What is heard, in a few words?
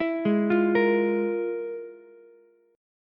F Minor Major 11th, Ukulele; arpeggiated; JGuitar